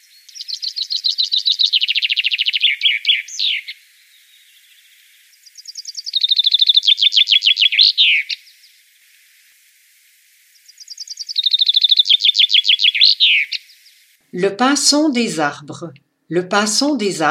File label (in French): Pinson, oiseau; Le pinson des arbres